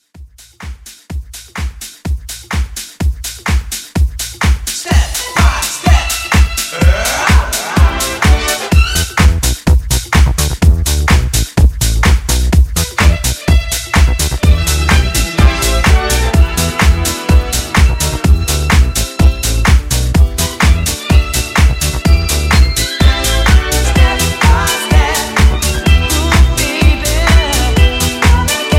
90s Pop House Re Drum 126

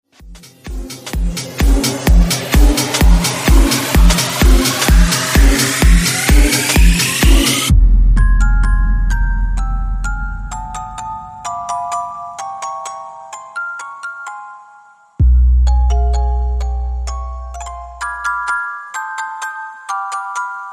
Christmas Banger

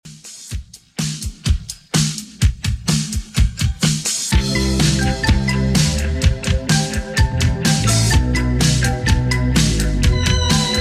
80s Redrum, 126bpm

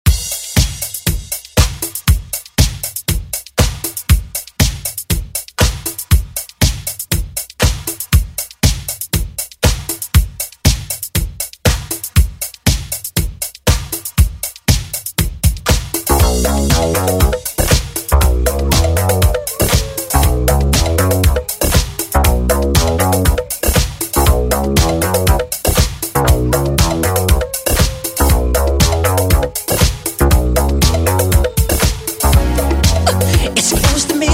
Pop Redrum

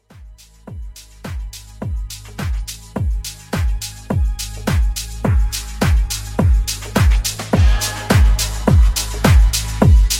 Transition 105 126

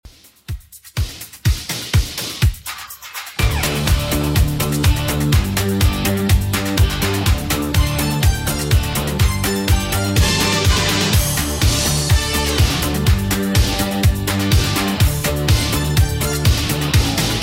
80s Redrum 124bpm

